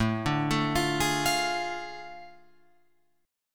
A Major 7th Suspended 4th Sharp 5th, Guitar, Open F; arpeggiated